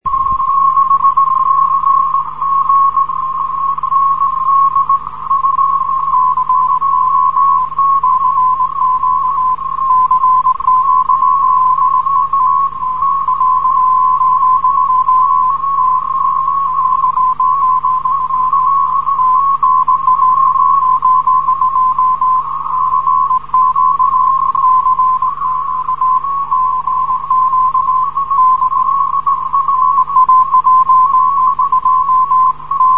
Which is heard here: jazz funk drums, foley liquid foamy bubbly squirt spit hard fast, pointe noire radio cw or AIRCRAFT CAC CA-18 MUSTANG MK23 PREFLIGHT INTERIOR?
pointe noire radio cw